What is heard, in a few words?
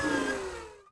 rep canon charge end; weapons